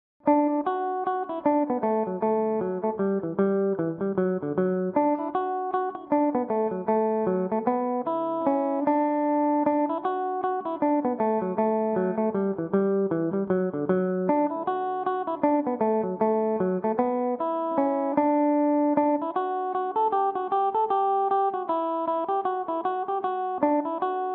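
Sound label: mazurka D major, mandolin; Eastwood Tenorcaster electric tenor guitar; The Glenties Mazurka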